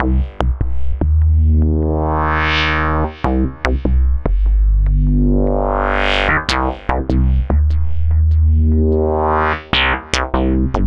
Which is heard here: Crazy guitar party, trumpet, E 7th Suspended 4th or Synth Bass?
Synth Bass